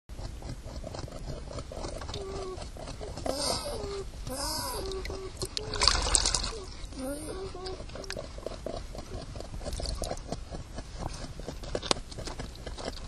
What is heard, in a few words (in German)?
Mutter Begruesst, biber